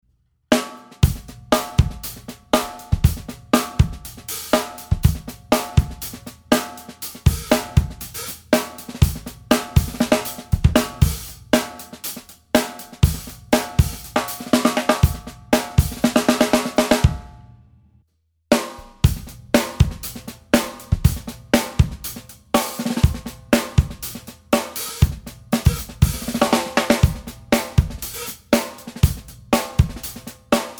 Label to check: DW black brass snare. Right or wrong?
Right